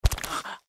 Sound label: feral ghoul foot run, npc; ghoulferal